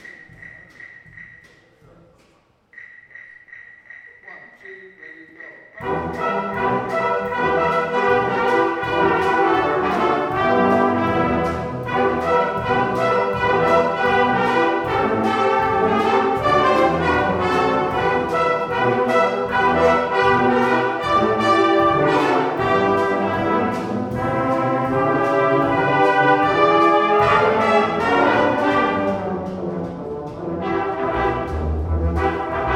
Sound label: brass; north star brass; woburn ma